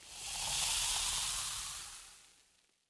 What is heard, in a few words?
Explode Fizzle; Going Under